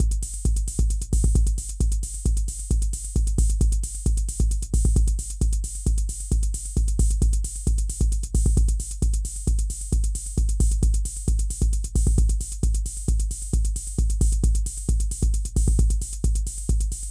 confused drums

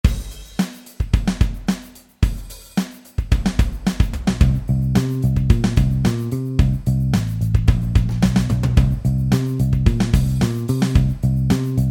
drums+basso

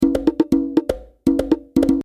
Loop

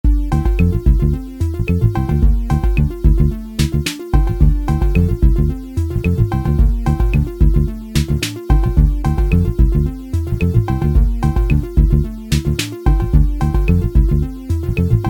bassline keyb

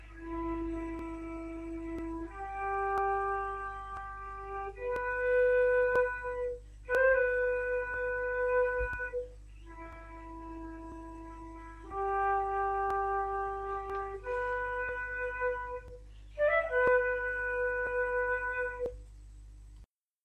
Flute

